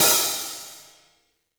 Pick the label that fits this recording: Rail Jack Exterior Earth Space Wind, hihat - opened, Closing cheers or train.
hihat - opened